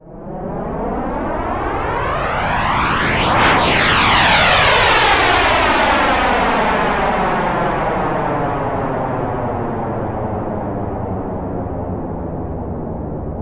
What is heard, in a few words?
Korg 01W Jet Stream